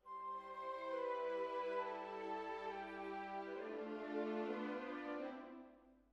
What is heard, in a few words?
Hall